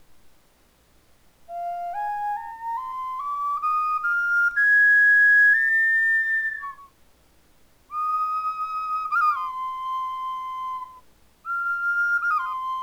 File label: high F, flutes